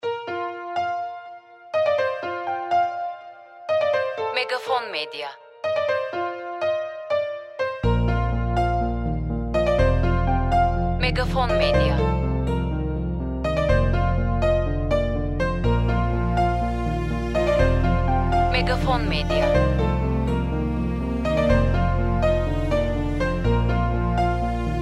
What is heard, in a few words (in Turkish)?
SANTRAL MÜZİK